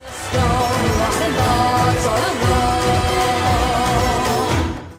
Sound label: sitar